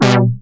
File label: reason syn; neuro; Sinistarr